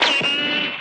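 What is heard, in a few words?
button; wrestle jump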